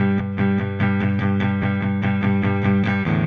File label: Silos Clean Strum Guitar, Indie Rock